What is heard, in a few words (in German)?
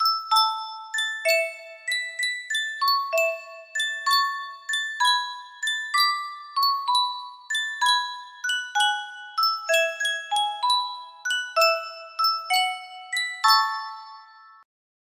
Spieluhr, music box melody; yunsheng custom tune